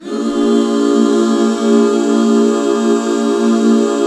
BB7, Back Chords; Sound & Vision - Gigapack II CD 1

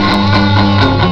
PITCH guitar; GUITARS KIT; Big Beat Samples & More; AKAI

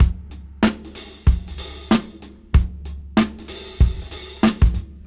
Funk 94 BPM; AMG - Keith LeBlanc Phat Butt Jams

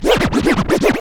Scratch; Club-50 - Foundations Roland

